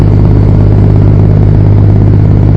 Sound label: scania 550R